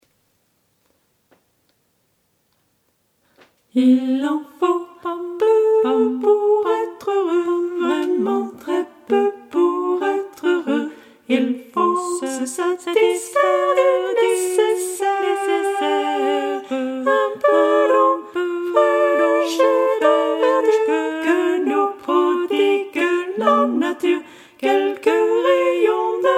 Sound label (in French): version chantée, choristes